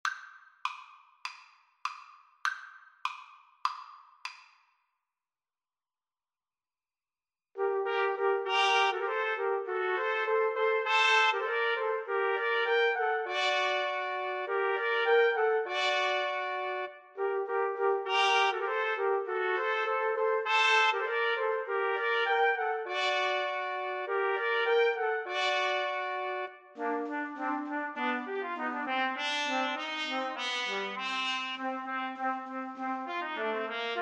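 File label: trumpet trio, karaoke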